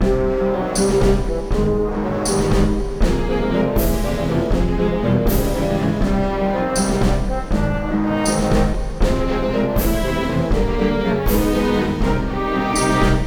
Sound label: Boss Duel Music Nuetral